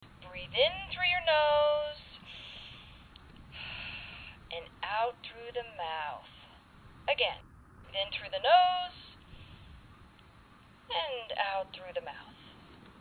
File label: relaxing